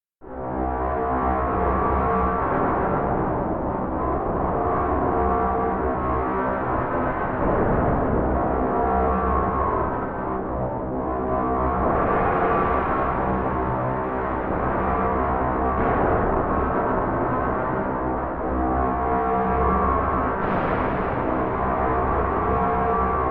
Drone Scifi Horror Atmosphere Tonal Foreboding Scary Dark; Sound Design